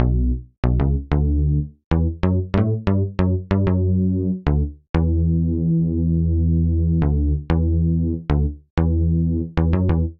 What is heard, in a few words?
Bass D Major 94 bpm; Bassline; Tonal; MIDI Clips; Drive and Glow; Ableton; Factory Packs